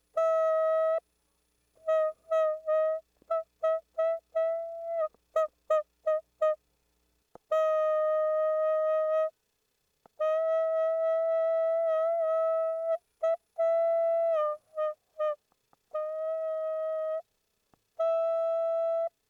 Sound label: coated film clarinet